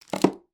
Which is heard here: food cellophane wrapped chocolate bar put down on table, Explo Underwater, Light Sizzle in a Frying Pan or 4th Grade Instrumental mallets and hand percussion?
food cellophane wrapped chocolate bar put down on table